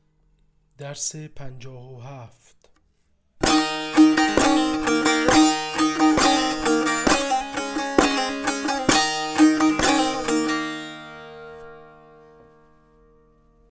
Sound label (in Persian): مشق تنبور